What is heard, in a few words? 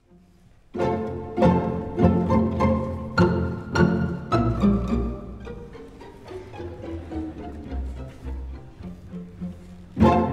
Pizzicato Polka; J.Strauss